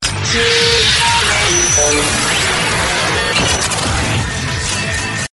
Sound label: chain array